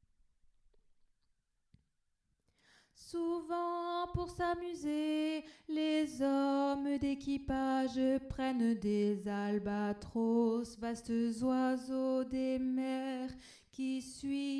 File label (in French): basse; l albatros